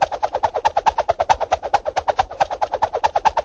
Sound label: kung fu staff twirl loop, drum loops and breaks